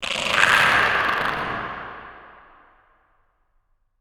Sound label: Timid